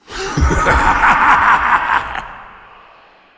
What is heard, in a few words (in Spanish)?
risa